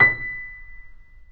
Mp B 2 off, Grand Piano off mic

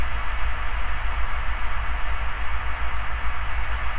InfiniVerb Noise, sparse soundscape Loops

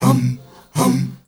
MALE HUM; Spectrasonics; Heart of Africa

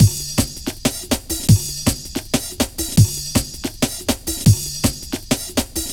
162, Loops, breaks; M8

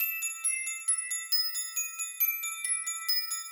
Glock 136-E, horrorscape samples 136bpm

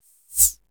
HAT, Hip Hop; E-MU Formula 4000 Series Vol. 1; Hip Hop Nation; Bonus Perk

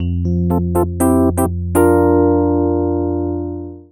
BALLAD EP; East West; Twisted Textures-BT

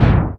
WHITE SOUP, PERC; NorthStar - The Wizard VOL-2; TSAR PERC

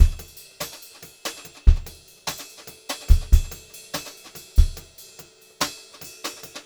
Brushed Drum Loops; USB Soundscan vol.39; AKAI

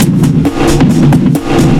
FX BEAT

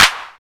PRC CLAP

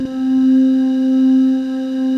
BOTTLE PADS; Pro-Rec - Synthsonic

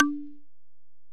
Bell, Alesis DM-PRO

